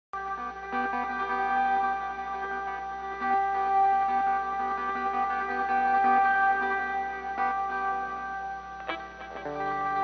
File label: ESP Main Guitar